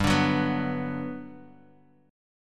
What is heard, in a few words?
G Major 7th, G, down, Guitar, Open D